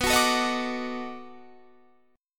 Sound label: B 7th Sharp 9th, down, Guitar, Standard; strummed